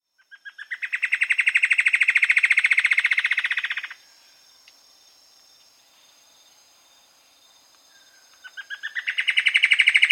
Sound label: Plumbeous Antbird; S. America